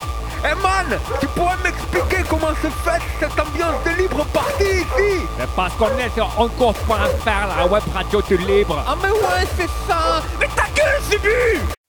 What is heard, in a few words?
jingle